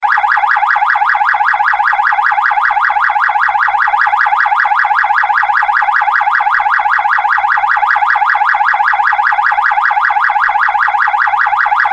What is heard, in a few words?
loud ringtone